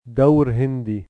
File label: dawr hindi